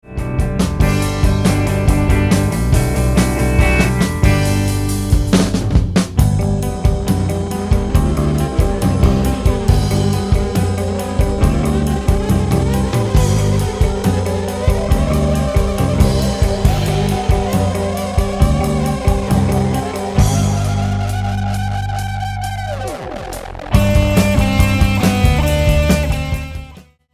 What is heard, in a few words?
drum